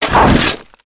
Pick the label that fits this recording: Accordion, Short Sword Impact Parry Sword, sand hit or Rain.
sand hit